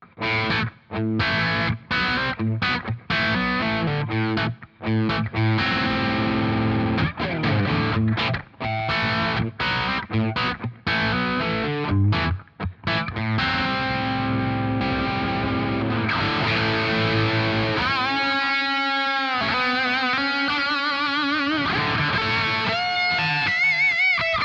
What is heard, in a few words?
Headrush Core The Classic Mark Rhy Lead Tape Echo